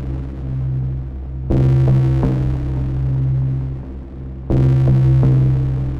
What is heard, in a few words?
Huge Reverb; Downbeat